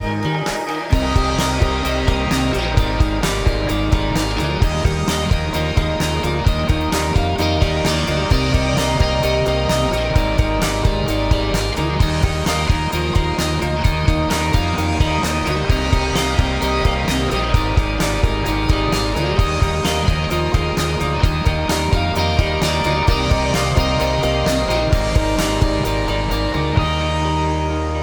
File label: Mix No Gtr Pad